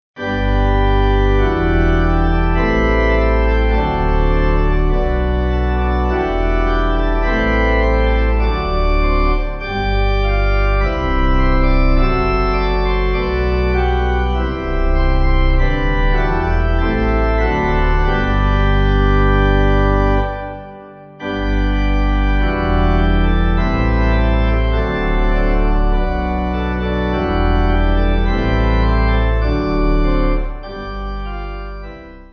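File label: Pipe organ, church music backing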